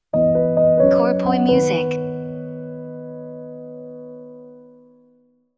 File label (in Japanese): jingle ウォーター